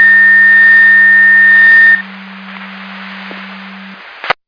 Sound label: Siren; Hamburg FD